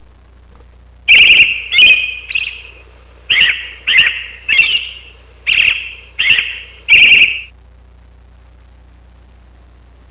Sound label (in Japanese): akahara